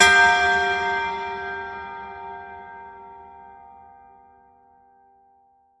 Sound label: Christmas Mass Bell Strike